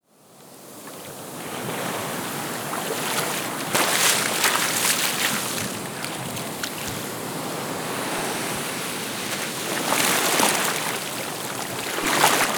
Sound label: SEA; USB Soundscan vol.45 - Indian Traditions; AKAI; AMBIENCE2